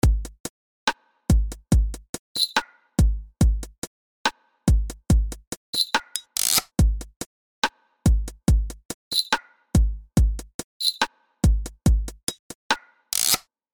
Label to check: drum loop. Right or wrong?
Right